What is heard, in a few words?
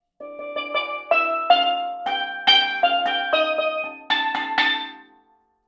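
Loop Steelpan Melody 160bpm D minor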